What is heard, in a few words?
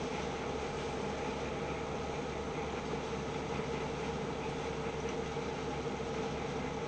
ambience industrial